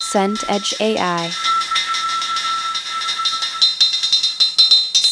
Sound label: rain gutter run